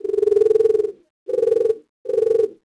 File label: pigeon cooing; Max Payne; ragnarock